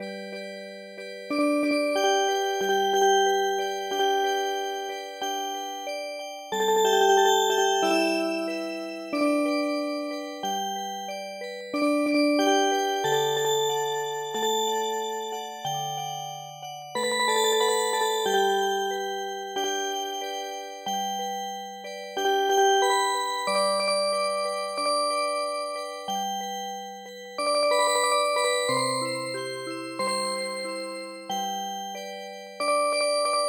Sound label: Music Box